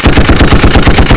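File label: heavy machine gun fire; weapons; starship trooper; stat weapons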